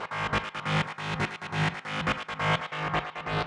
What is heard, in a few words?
Syncer Line WET 138BPM, G# minor; Bassline & Melody; Vengeance Samples; VTS1 Zodiac Kit